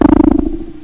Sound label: BOING; eXtended Instrument